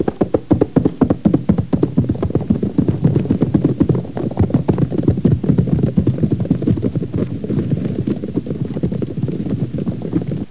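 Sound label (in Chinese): ma jiaosheng, horse neighing; jiachujiaosheng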